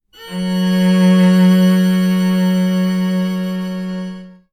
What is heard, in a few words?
F# major, Chords, Strings